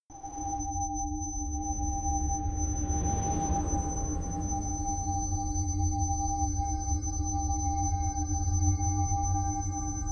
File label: Uplift & Ease (Subliminal Brainwave Entrainment)